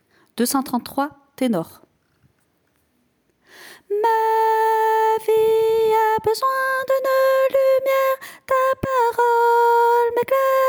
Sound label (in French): ténor